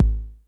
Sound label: 909 LO KIK; drum